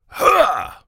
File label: effort